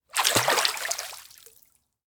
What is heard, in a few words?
soak open air bath; anemoi